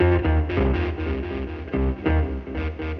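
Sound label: electric guitar, ethno, 160; eJay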